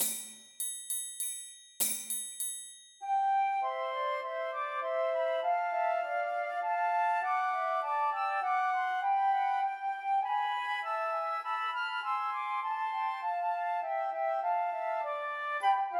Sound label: Trios for Three Flutes; We Wish You a Merry Christmas